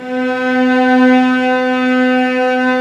Vcs6 f Amb

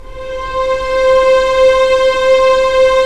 STR, Roland LA Composer

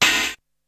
Open hat; ZERO G - Datafile DISC 2

